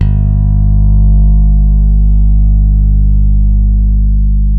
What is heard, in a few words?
PRECISION BS, Bass, A 2; Spectrasonics; Bass Legends